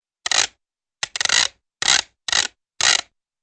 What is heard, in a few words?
jacking ratchet clicks